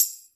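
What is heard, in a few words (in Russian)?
тамбурин одиночный легкий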